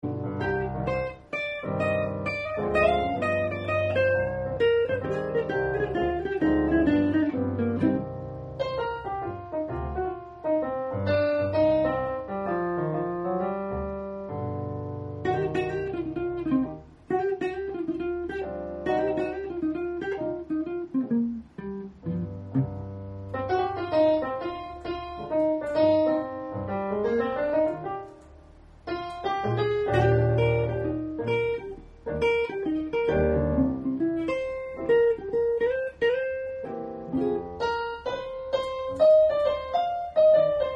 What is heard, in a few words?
softly 4 bars